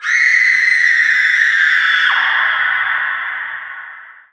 female scream

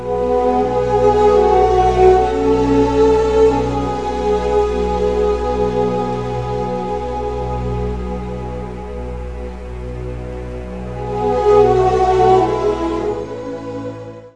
win music

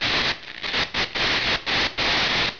sparks, ambience

